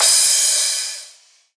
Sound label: crash; osu